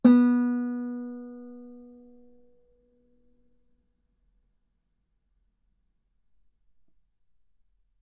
Guitar B3; ff; sul B

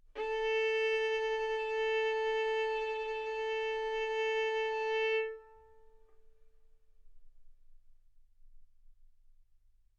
Violin arco A4 B4; mf; sul A; stereo

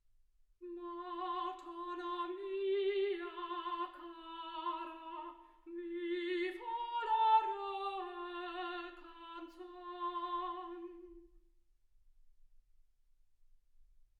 soprano

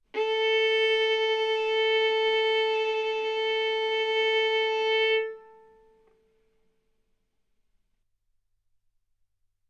Violin arco A4 B4; mf; sul A; stereo

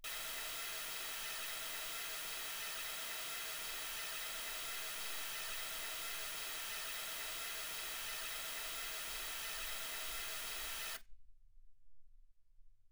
mls16 48kHz

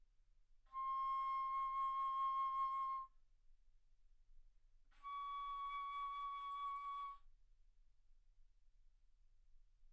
Bass Flute ff C6 Db6

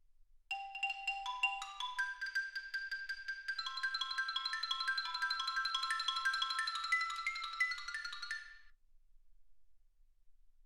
xylophone

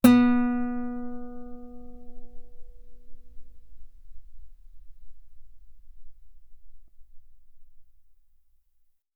Guitar ff sul B B3

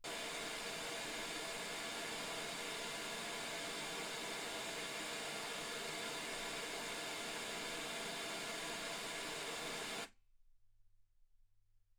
wgn

